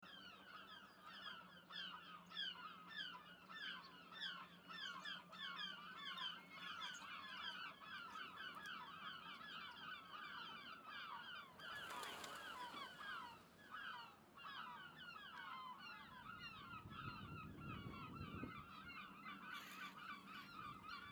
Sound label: seagull flock